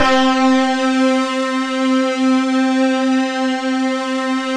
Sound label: SQ1, Synthesizers